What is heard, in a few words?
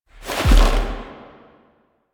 Drifter Pull